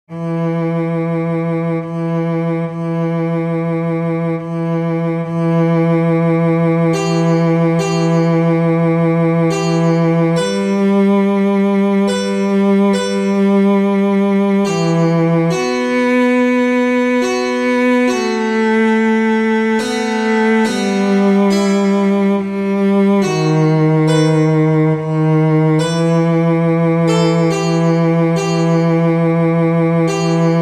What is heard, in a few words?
Cello Sonata; Vivaldi; Cello Sonata No.5 Ⅲ; チェロソナタ第５番第３楽章（シチリアーノ）